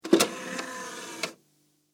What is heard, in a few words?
Dvd Blu Ray Machine Tray Opens; Entertainment System Sound Effects